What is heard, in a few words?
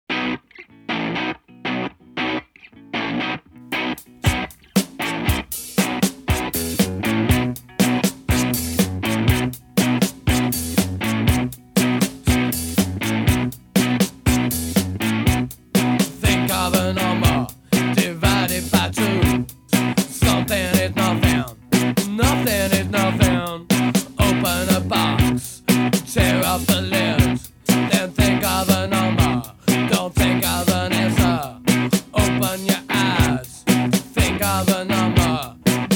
Rhumba